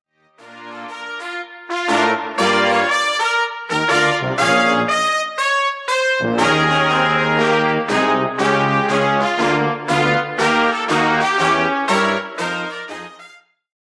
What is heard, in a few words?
brass